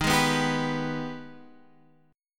G sharp Minor Major 7th D sharp

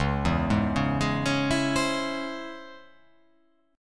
C sharp Minor Major 7th C sharp, chord

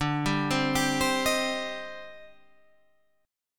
C Minor D; Cm/D arpeggiated; JGuitar

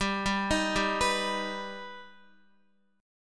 G Augmented G Guitar; G+ arpeggiated; x,10,7,8,7,7; JGuitar

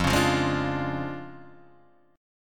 D Minor Major 7th Flat 5th, F

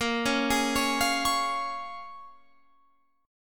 Bb Minor 7th, Guitar, Open F; Bbm7 arpeggiated

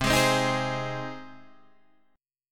C Minor Sharp 5th C down Guitar Half Step Down

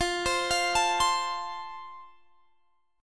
F Major Guitar Open G, chord; x,22,22,22,22,22; down; chord shape

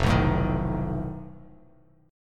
F 7th Flat 5th F Bass Standard; F7b5 strummed

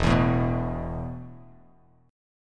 E Suspended 4th E, down, Bass Standard; Esus4 strummed

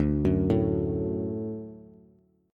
D Major D down Bass; D arpeggiated; JGuitar

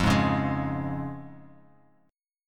F Minor Sharp 5th, chord; 13,11,11,13; Fm#5 strummed; JGuitar

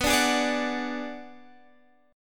B-Minor 6th B down Guitar Standard; Bm6 strummed; JGuitar

